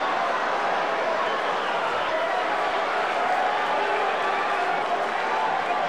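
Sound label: Basketball Crowd Ambience Loop; Crowds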